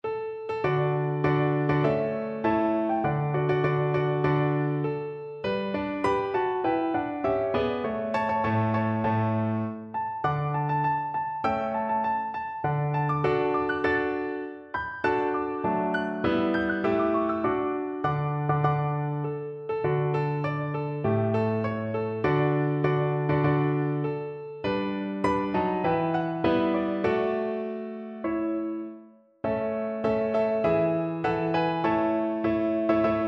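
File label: viola karaoke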